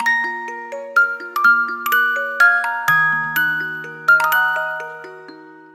music box; Identity V

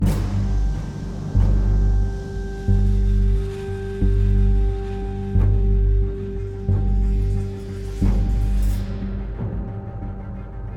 loop Stealth

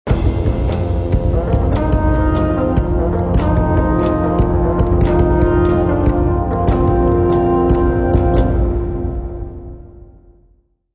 Ancient Combat; Civilization Revolution; Xbox

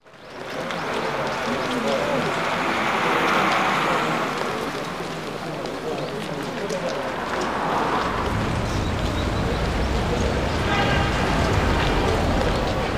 Ambient BGM

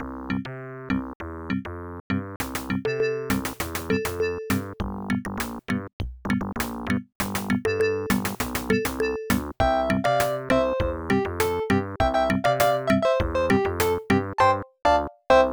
Growing Up Town (Short); SimCity 2000; ibm pc at

